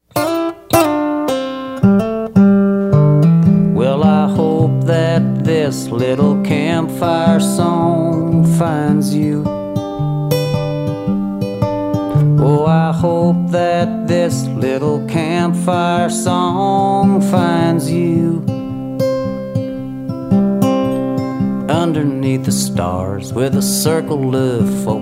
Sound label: Campfire Song